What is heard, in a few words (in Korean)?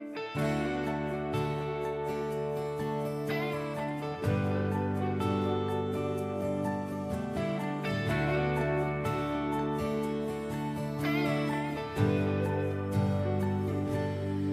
중국여 노래